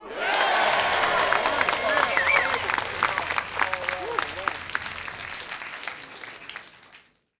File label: crowd eagle win